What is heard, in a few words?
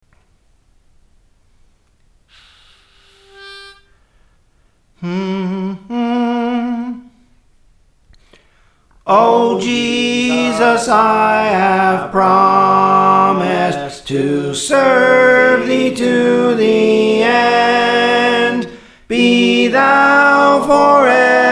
Soprano, Songs; Praise For The Lord Training